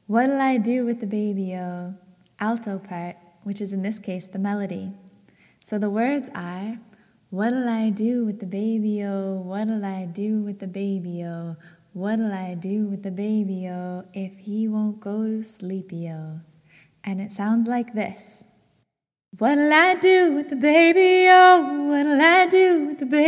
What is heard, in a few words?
alto